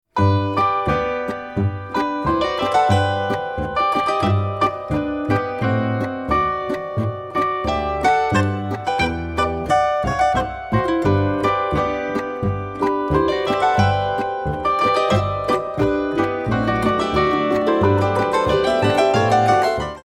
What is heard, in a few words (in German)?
empfangsboarischer; salzburger